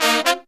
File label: Phantom Horns, 132BPM; 90 s Sample CDs; Zero-G